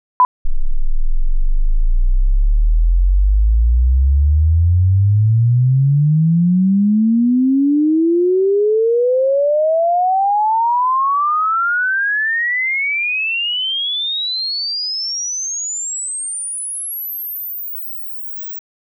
Test signal (44 kHz 24-bit) Frequency Response (swept sine)